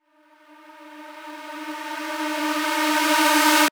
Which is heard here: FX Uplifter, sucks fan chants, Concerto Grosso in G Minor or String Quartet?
FX Uplifter